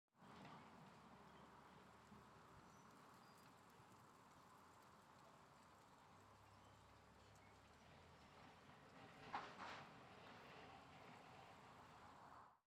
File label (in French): Friche Scierie Châtenois Voitures Rail Scierie